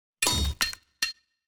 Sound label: environment successful build stinger